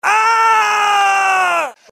scream, male; gmod; npc

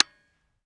fire disconnector; gmod; viper

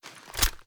reload waffle end; reloads; gmod; iw8

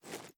reload empty toss; reloads; gmod

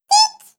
blinker switch on; gmod; svmod

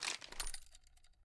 ar idle active move, weapons; gmod; viper

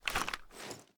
reload empty rotate; weapons; gmod; viper

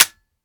fire dryfire; gmod; viper

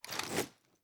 kilo98 rechamber cloth; viper

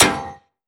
frag physics bounce metal hard; gmod; viper

